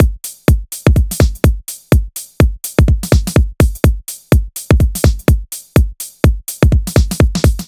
Multiple, диско-хаус

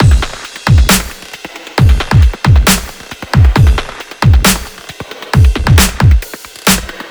Table Kit; Барабаны

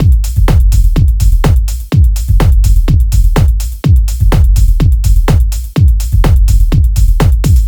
Subway B, dance

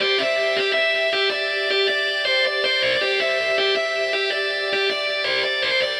Fakeguit, ambient; сэмпл гитары

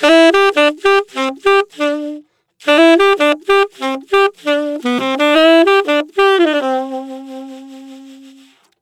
Television, саксофон

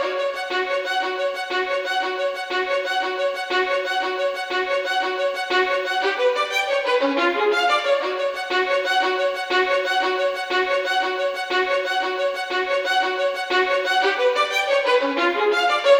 Spiccato Violin

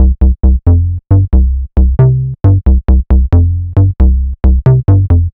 Trio Bass

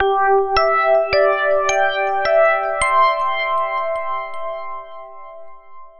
Hammer EP, electro piano

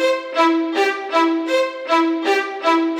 Violins, струнные инструменты

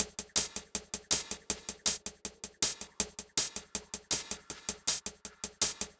Polarbeat F, ambient

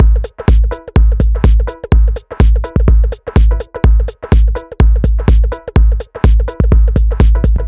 Toner B; Сэмпл ударных (Минимал-Электро); Minimal Electro; 125 BPM; Beat; Drums; loop

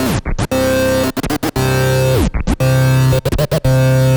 Scratch Pad

